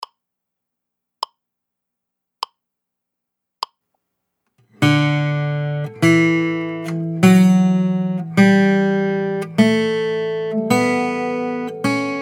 D Scale

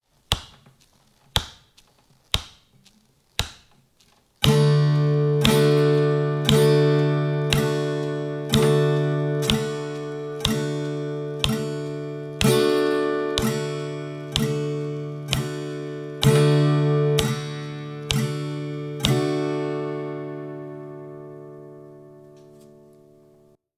Simple Strum

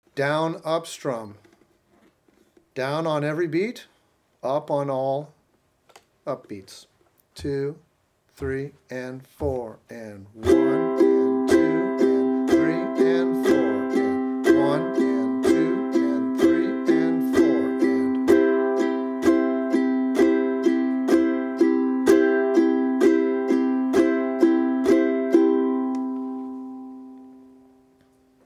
Down Up Strum

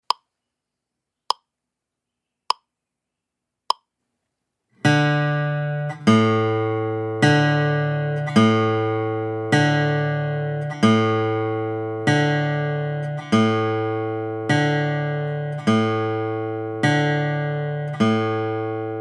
Alternating Bass

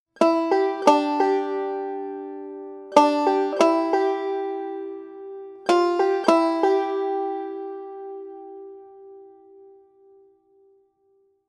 Double Thumbing

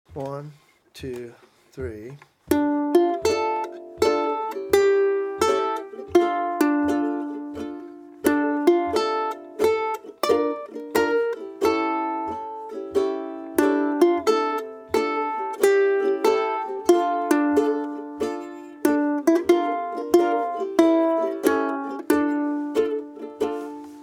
Blues

